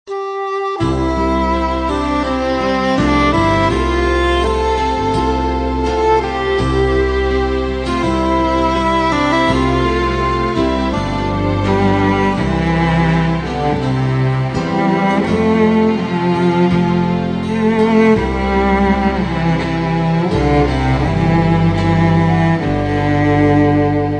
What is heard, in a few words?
Instrumental Hymns